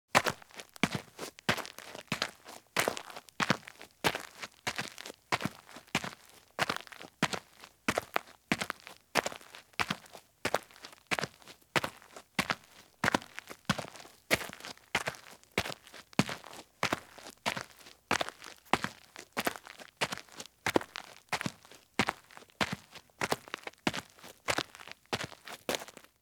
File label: Running Shoes Walk at Fast Pace on Roadside or Driveway Gravel; Sound Ideas; Footstep Sound Effects